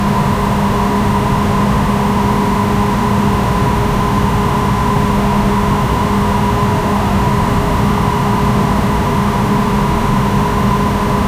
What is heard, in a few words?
air ventilation noise, air conditioner